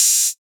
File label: Hi-Hat Open 808; HIGHWAY 5; 808Flex